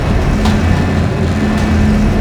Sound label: docking in progress, ships